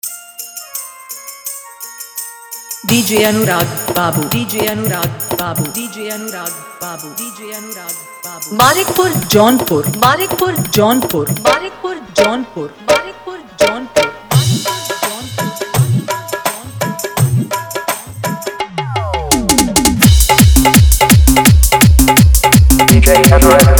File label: Bhojpuri Fully Dhollki Bass Mix